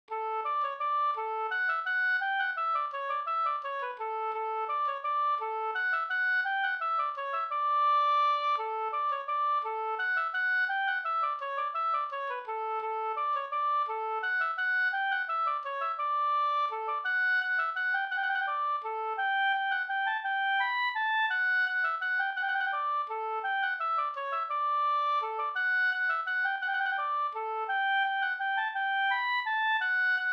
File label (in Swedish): vals; CA Lindblom